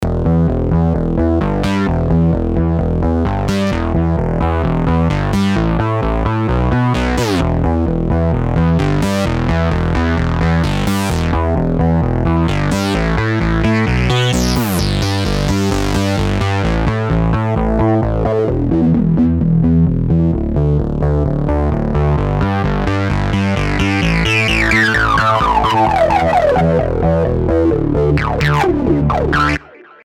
russian filter basslauf LP fX

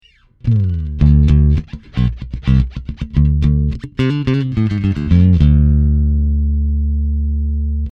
Markbass MB58R CMD 102 Pure Pick Badewanne DI

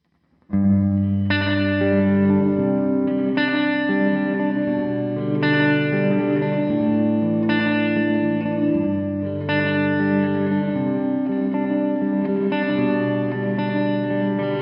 Hall

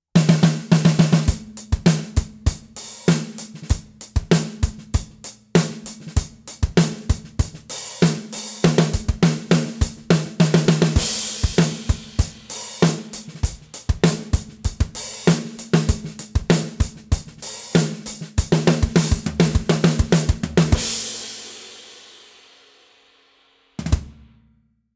Protean tief mit Ring

